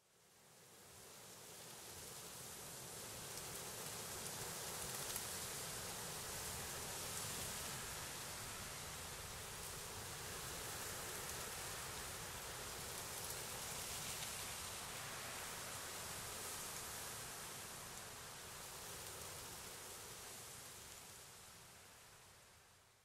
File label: wind; Zomboid; The Calm Before The Storm